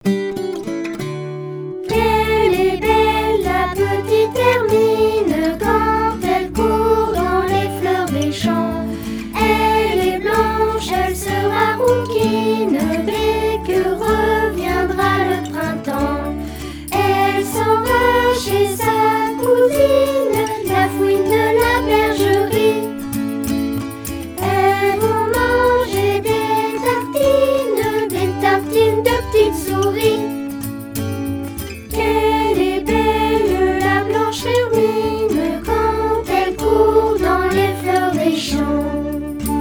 Chanson

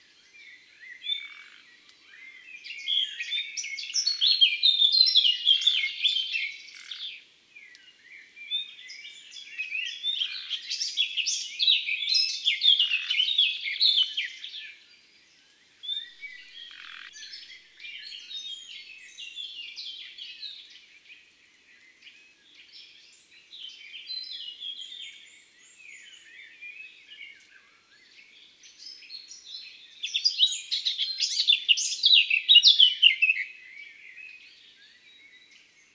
Rossignol philomele cris Chants d'oiseaux dans la campagne

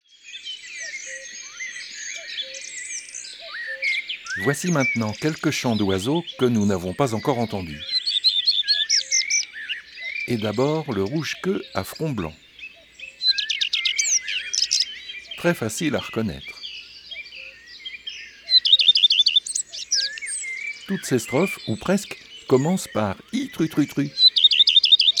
Rougequeue a front blanc; ABC des chants d oiseaux

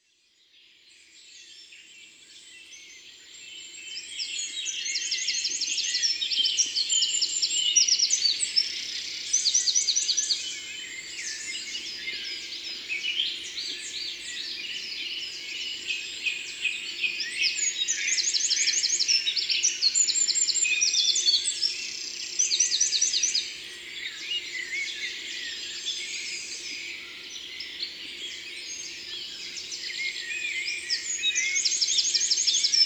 Troglodyte mignon; Concerts Naturels de la Drome; Studio les Trois Becs

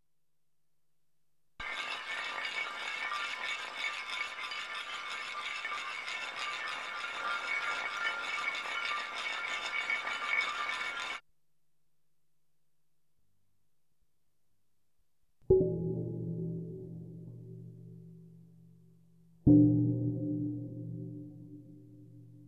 Phonolithes; creation originale de Didier Beauvallet; Lalevade d Ardeche; Ambiances Sonores d Ardeche

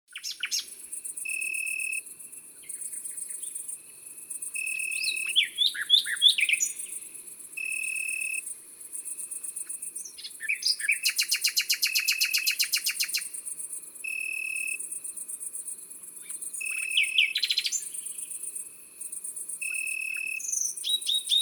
Grande sauterelle verte; Concerts Naturels de la Drôme; Studio les Trois Becs

